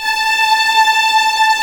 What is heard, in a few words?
A5 TREM, VLNS TREMOLO, String; East West; The Ultimate String Collection